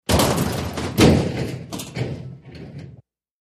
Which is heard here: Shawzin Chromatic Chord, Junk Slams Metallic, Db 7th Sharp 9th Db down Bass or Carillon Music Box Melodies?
Junk Slams Metallic